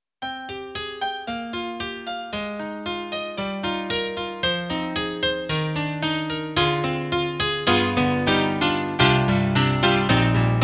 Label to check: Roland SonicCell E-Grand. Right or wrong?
Right